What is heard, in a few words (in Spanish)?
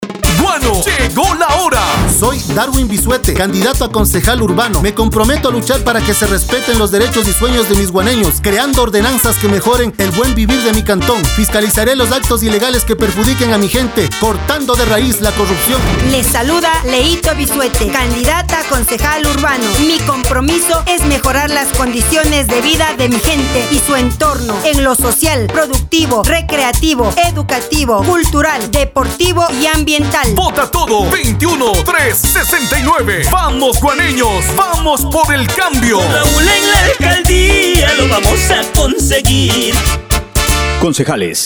jingle radio; consejales urbanos; spot politica